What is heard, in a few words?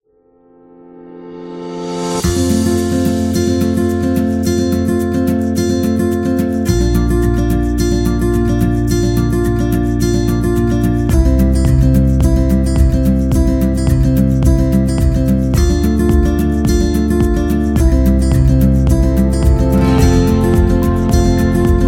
Guitar Background, happy music